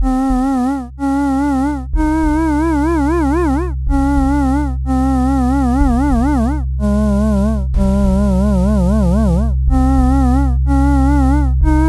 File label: dark frigiyskiy minor